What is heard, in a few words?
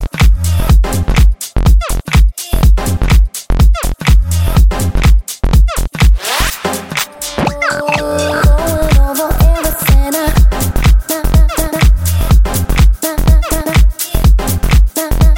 best electro mix